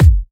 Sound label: Kicks; Vengeance Samples